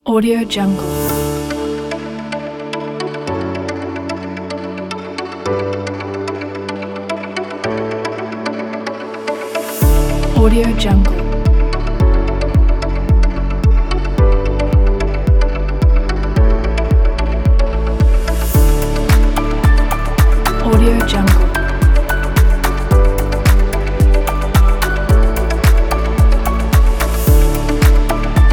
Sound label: soft inspire corporate